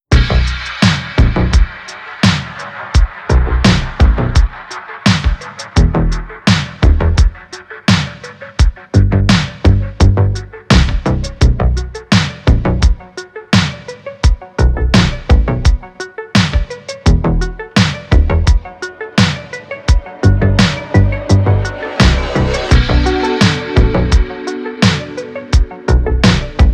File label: synthwave dark